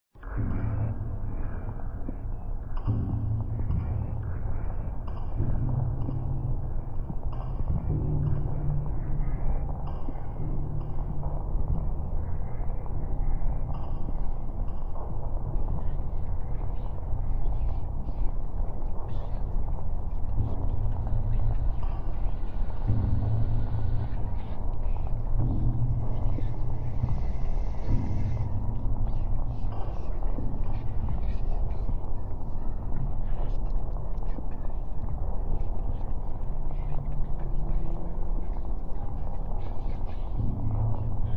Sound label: Zero Noise; A New Home; fangame